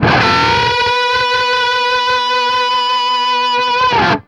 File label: DOUBLE B 3, guitar; East West; Steve Stevens Guitar Samples Collection; DOUBLES